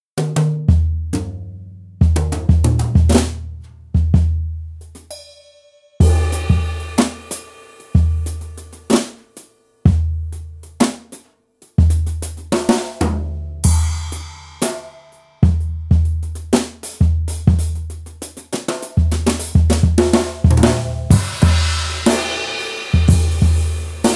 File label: brushes